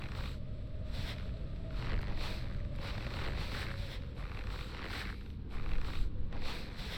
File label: walk snow; We Were Here Forever